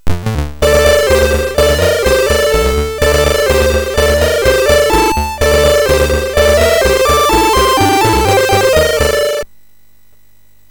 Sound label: sid MOS6581R3, GAMES; Continental Circus